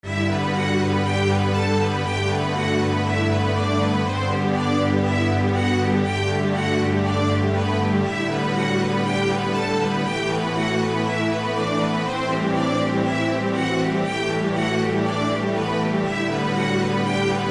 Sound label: Classical Test